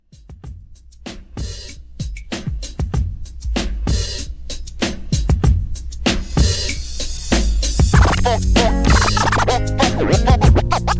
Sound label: 90s Scratch Re Drum 96